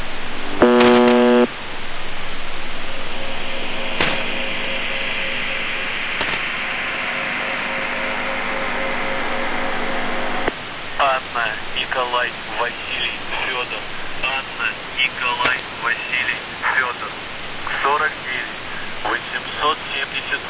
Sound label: buzzer usb msg